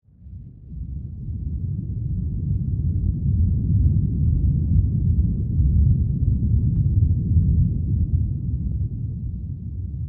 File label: Earthquake Loop; weather fx; Silent Hill Downpour